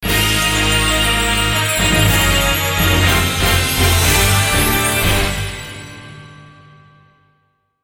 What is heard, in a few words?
Jingle Fanfare; ps3; Kamen Rider Summonride